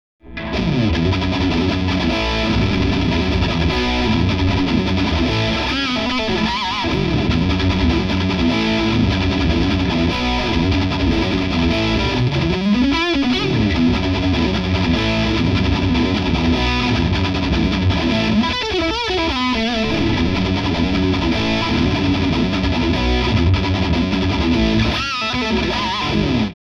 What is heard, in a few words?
Les Paul Heavy Drive; egnater